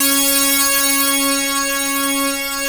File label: POLYMOOG C4; USB Soundscan vol.49; Analog Fantasies; AKAI